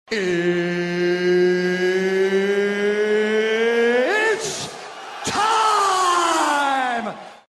wrestling

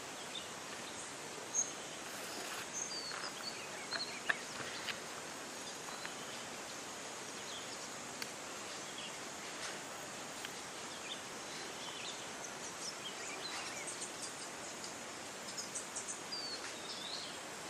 Buff throated saltator

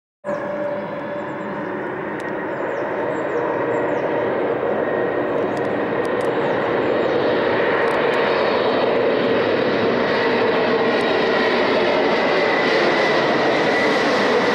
Concorde; هواپیما کنکورد

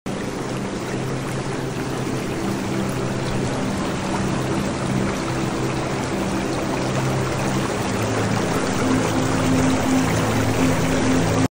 Jacuzzi water; آب جکوزی

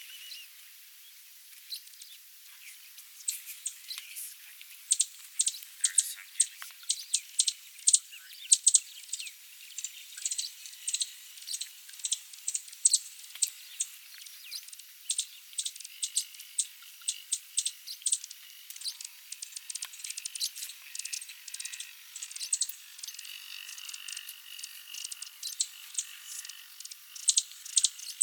Horned Sungem, پرنده

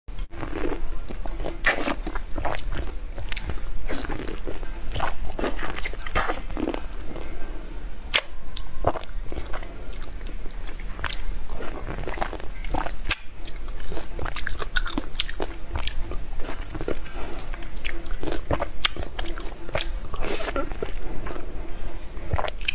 Eating lemon

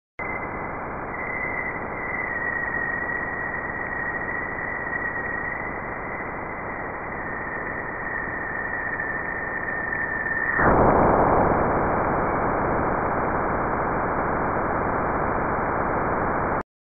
Jupiter, امواج رادیویی سیاره مشتری

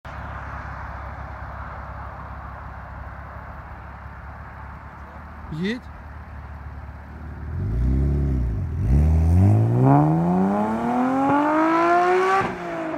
mitsubishi

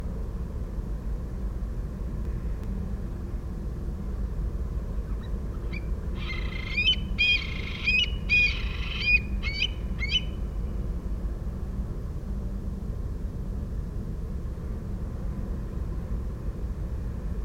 Eurasian stone curlew

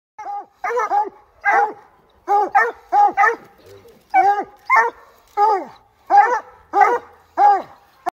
Estonian Hound; تازی استونیایی

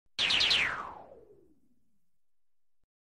Laser fire; شلیک لیزری

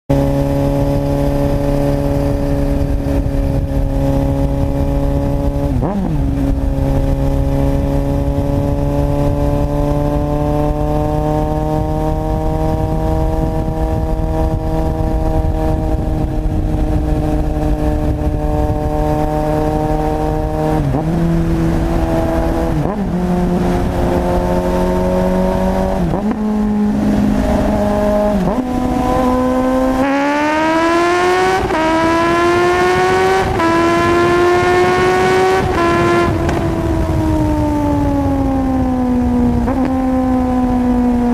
Acceleration

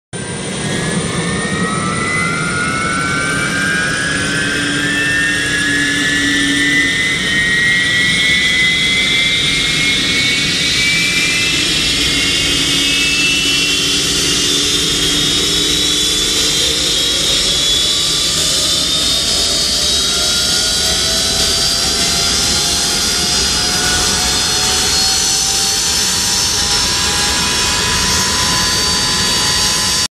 Ilyushin Il 62

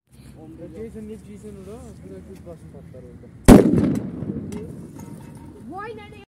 Carbide; کاربیت

